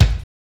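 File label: BASS DRUM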